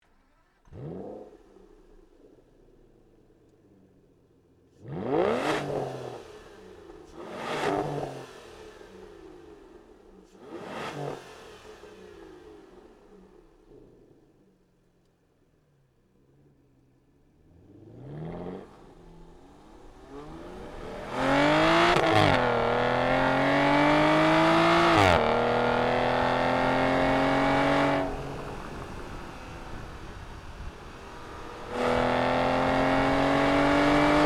Aston Martin DBS

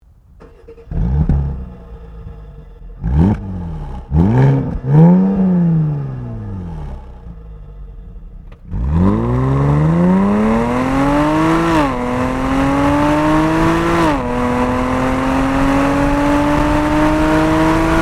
Mercedes Benz SLK350

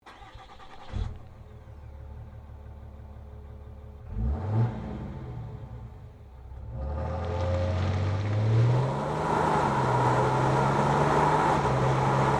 Ram 3500

